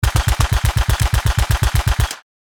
M16 assault rifle short burst

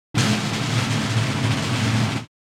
Drum rolls short

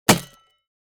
Cartoon falling body impact

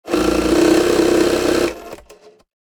Casino slot machine ticket eject

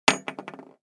Dropping a ring on a wood table

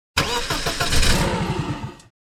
Motorbike ignition fail; vehicle

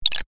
Robot blip

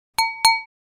Dining room dinner glass cheers

